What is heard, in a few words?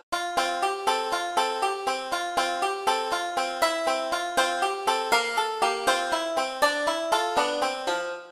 banjo, musical instrument; cataclysm-dda-soundpack; jcsoundpack